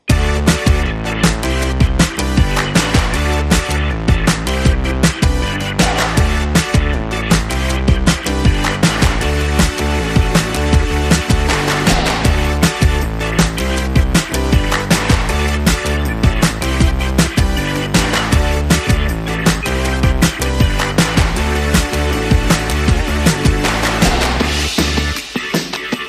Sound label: No Guitar